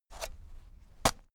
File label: digging